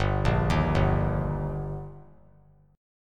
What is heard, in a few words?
G Minor Sharp 5th G, chord; arpeggiated; JGuitar